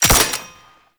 Belt Fed Rifle Low Clip A; Warframe; Tenno; Frumentarius

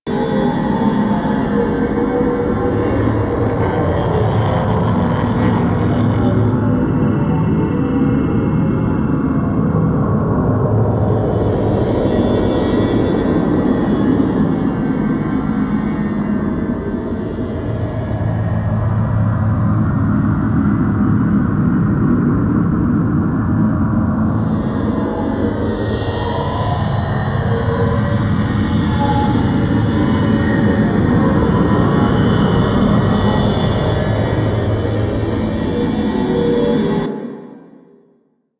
Interior Office; Warframe; Corpus

